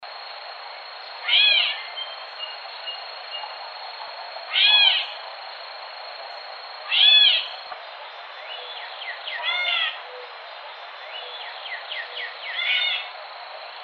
Gray Catbird

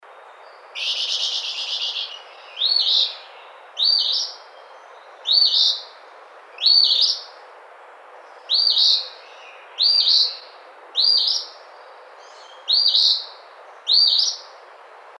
Eastern Phoebe

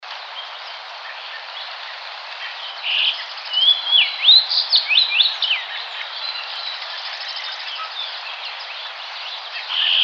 Fox Sparrow

